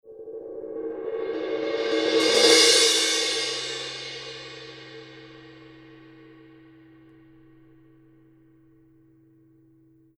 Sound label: Color Sound 900 Black Crash Paiste